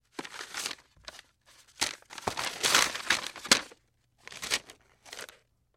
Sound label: Parchment Paper Hand